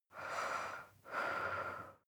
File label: breath female normal